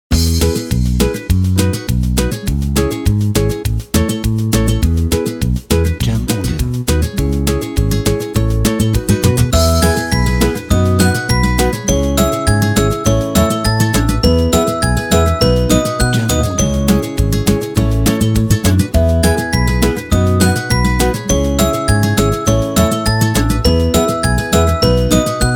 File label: Positive Background Acoustic